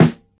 studio snare one shot d sharp key, Royality free steel snare drum tuned to the D# note